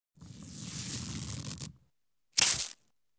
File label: Tearing Fabric; BBC Sound Effects Library